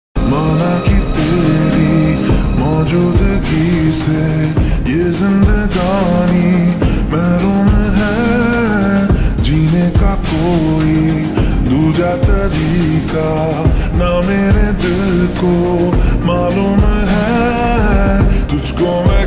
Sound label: Slow Reverb Version Ringtone